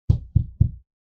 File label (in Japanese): 外側からを壁を叩く音